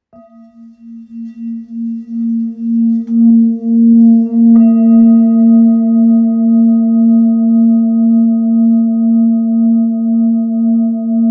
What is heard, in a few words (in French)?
Bol thick la 1.8kg